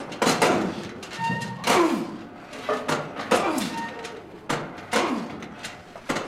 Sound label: Gym Machines and Weight Ambience; gyms